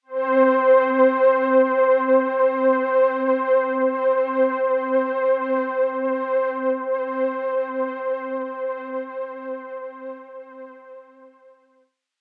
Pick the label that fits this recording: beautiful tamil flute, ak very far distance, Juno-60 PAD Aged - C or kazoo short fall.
Juno-60 PAD Aged - C